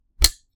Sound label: Switch